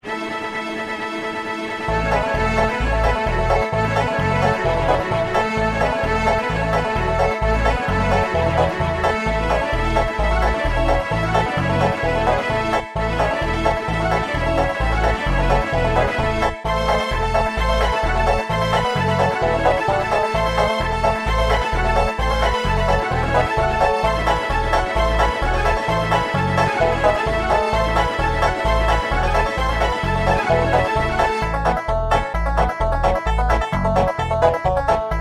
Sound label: traditional bluegrass fiddle music banjo and strings; sally goodin; syntheway strings